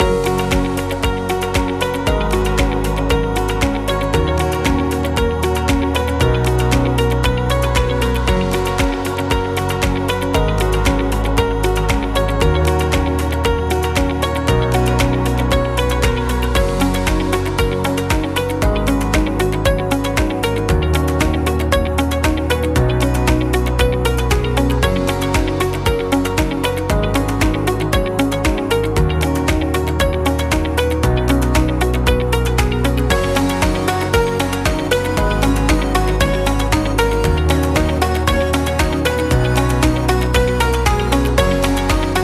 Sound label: Technology Corporate Background Loop